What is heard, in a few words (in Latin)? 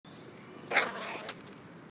Corvus corone; SETE